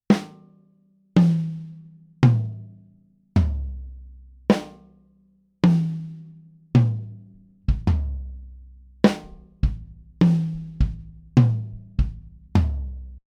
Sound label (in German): Snare u Toms; sE Electronics V Pack